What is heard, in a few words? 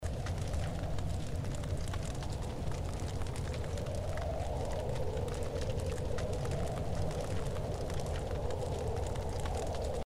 ambient city